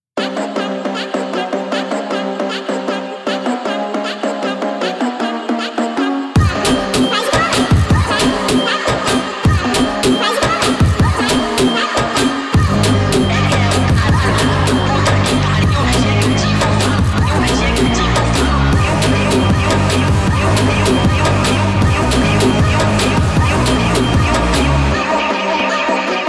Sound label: brazilian phonk sped up